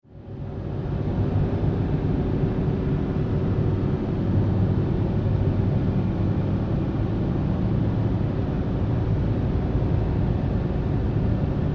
Ambience Factory Room Tone Rumble Industry; Factories; Backgrounds

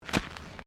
Footsteps Concrete Step; Crocs; Footstep Foley; Footsteps (Sound Effects:Human)

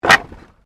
Wood Movement Heavy; Material components for constructing more complex sounds; Materials

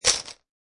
Poker Chip Piles Dropping On Poker Chip Piles

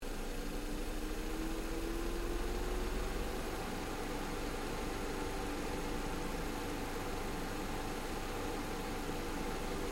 Car Ford Focus Idle Low Engine Bay

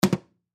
Plastic Drop Hit Counter Top; Plastic Container; Drops (Sound Effects:Objects); Foley; Blastwave

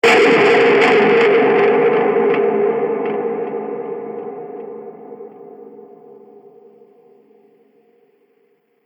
Massive Distorted Impact, explosion sound effect; Andrew Fleming

